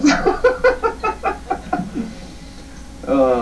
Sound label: laugh